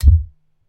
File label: TV Tube Power On; SoundsCrate